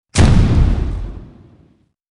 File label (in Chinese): 炮击; Wild West Shootout (Arcade) (gamerip) (2019)